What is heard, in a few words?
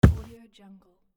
hit the ground